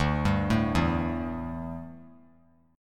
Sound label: D Suspended 2nd Suspended 4th D down; arpeggiated